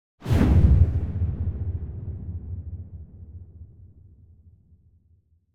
Symphonic Hit Bass Strings Hit - Strongest And Deepest; Hollywood Edge; sound designer's toolkit v2